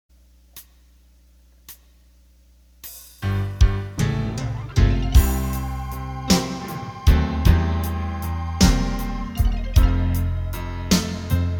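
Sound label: Blues, Roland accordion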